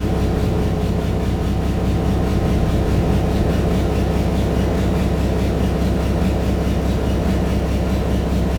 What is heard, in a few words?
Machines; Dream Zone